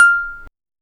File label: a clean dry audio recordi, single note